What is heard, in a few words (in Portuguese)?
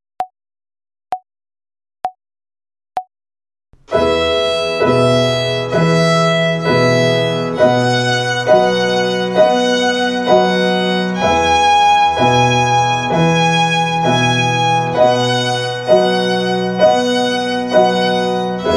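Ginástica até o dedo 2 - Corda Mi - Quaternária, Violino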